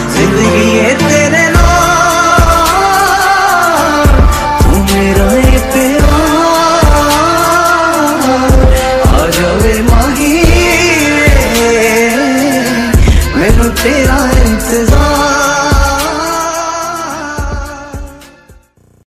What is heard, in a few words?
ringtone, bollywood ringtones